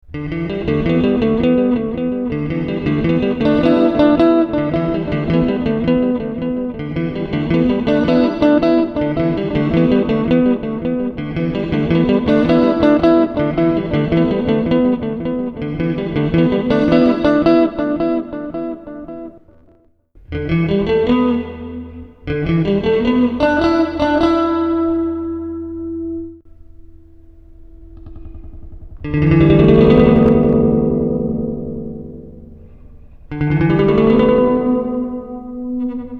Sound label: delay rev